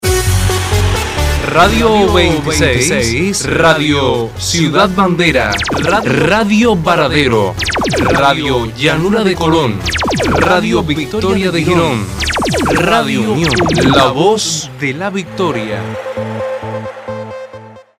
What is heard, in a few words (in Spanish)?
jingle rmx emisoras; matanzas